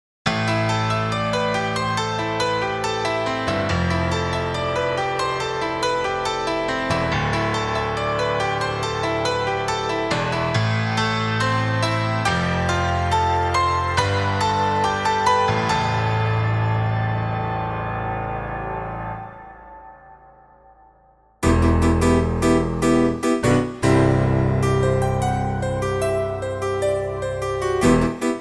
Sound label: Montage Agressive Layers